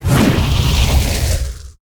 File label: Flame Breath FLAME; Evolve; Meteor Goliath